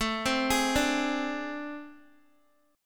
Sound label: A Minor Major 7th Double Flat 5th, down, Guitar; arpeggiated; JGuitar